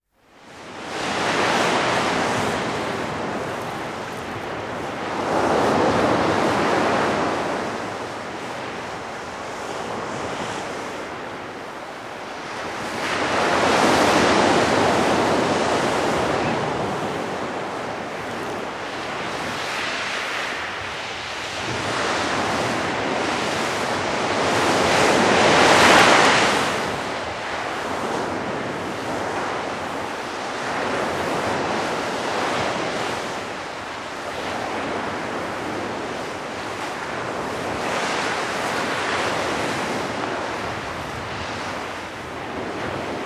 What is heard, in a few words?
Waves Crashing On the Shore; Dr. Sound Effects; 210 Nature Sounds - 20 Hours of Relaxing Natural Ambiences for Meditation and Sleep